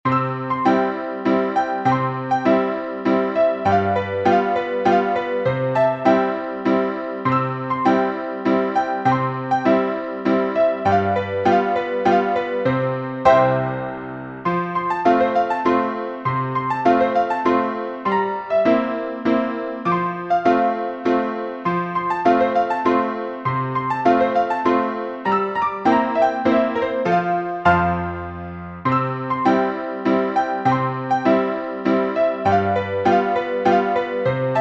piano, sheet music

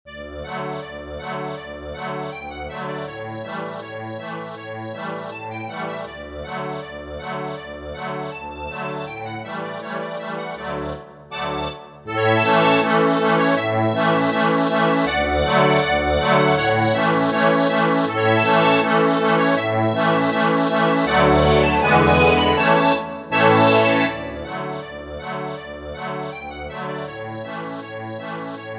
accordion

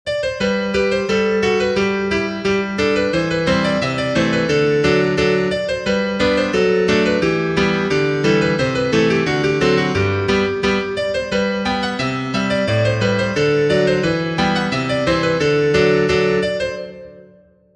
piano, sheet music

